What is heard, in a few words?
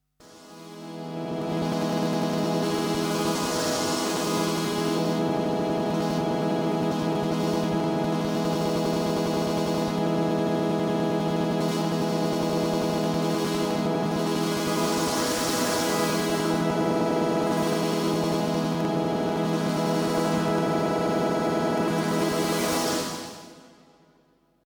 expression drone